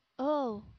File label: owl